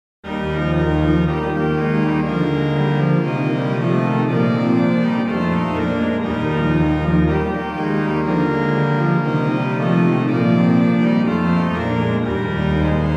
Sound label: Noel, Organ Music, Souvigny; Boely